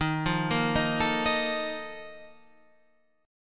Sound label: Bb Major 7th Suspended 4th, Eb, chord